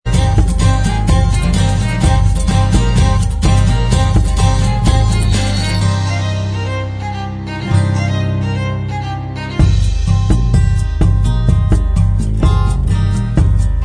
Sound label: acoustic version Inst